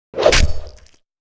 arc whoosh; weapons; dota; pudge

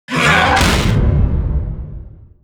spawnlord stomp; weapons; dota; creep; neutral

